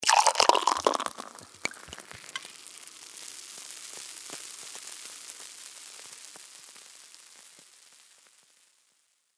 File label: Champagne Pour, Foley, Champagne, Bottle, Pour, Fizz; Bars; Champagne Sound Effects